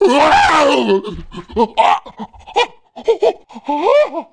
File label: ELECTROCUTE; Messiah